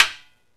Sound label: CONGA SET; OMI - Universe of Sounds